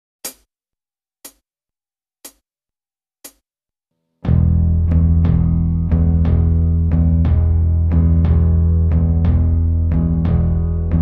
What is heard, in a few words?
Solo Section [backing Track]